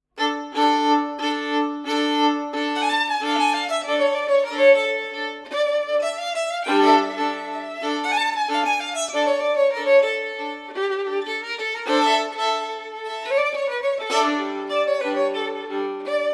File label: Transylvanian Dance